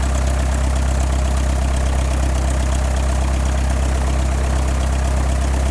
c172; fsx

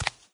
step, fat zombie; doom3

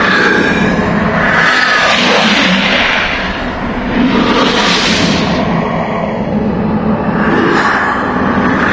flight; doom3; forgottenone

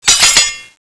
slash change; tfa cso; holy sword

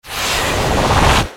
magic cloud before

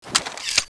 draw, weapons; cso; tornado

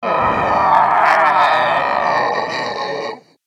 alert, zomb female; nmr

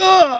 death

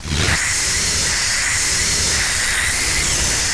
green gecko spit; npc

